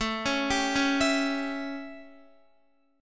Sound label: A 7th A, Guitar; arpeggiated